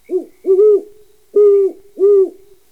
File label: howl